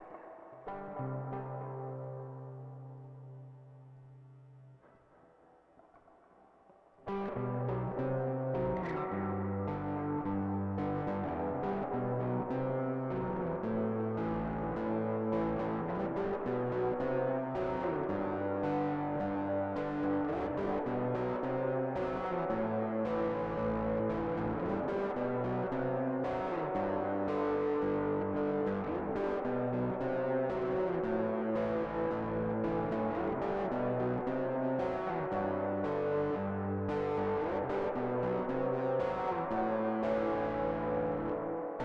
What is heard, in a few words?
Guitar; FULL BAND